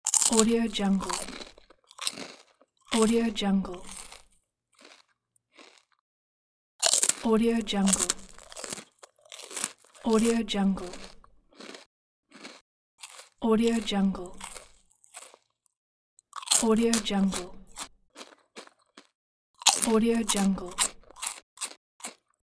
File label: eat chips